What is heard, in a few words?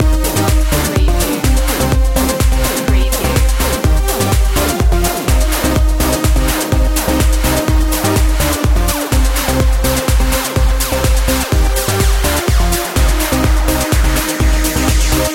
swedish trance loop